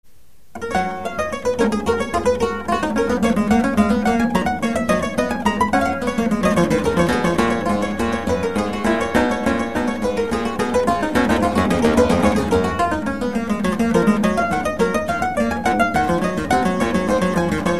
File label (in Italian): clavicordo; Hubert